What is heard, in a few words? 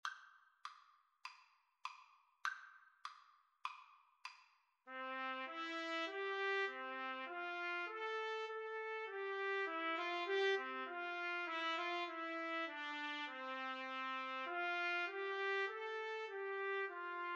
Trumpet Trombone Duet